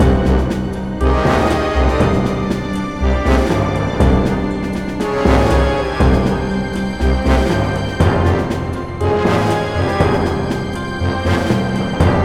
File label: Music Layer; Pilot Down Behind Enemy Lines